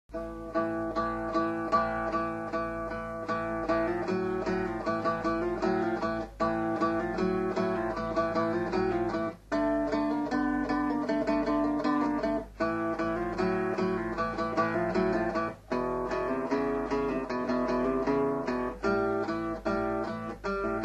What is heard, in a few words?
Disco glider, Guitar; Jolly Guitar